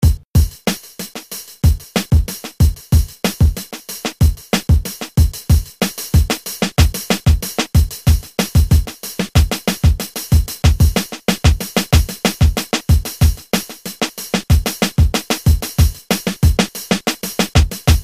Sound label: Breaks Pack